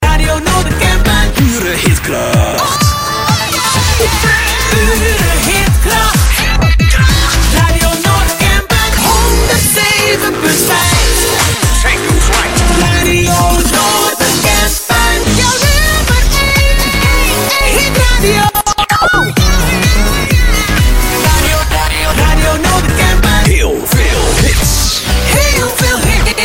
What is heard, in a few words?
Jingles